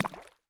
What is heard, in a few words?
Inf Weapons; Red Orchestra 41-45